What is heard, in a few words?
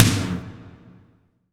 toms, cinematic impact, drum